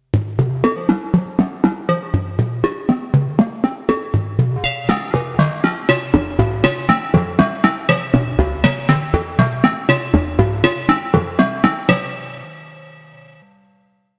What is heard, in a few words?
UNO Synth Pro X Presets